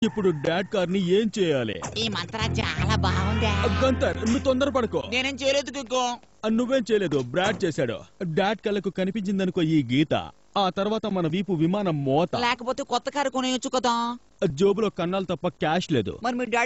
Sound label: kick